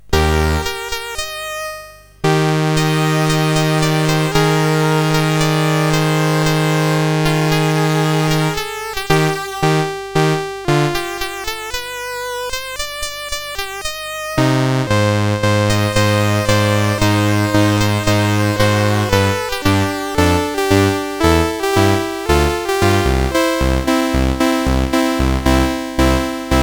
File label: Recitative, CSG8580R5; Pirates of Penzance